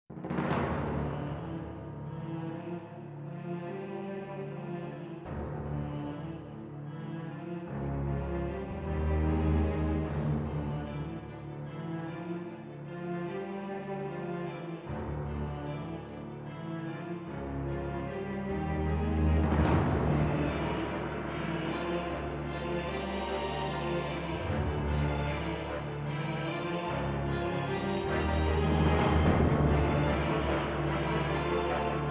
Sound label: Gothic Power (Trailer Music)